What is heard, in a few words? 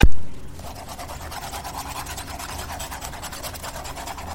pencil scratching sound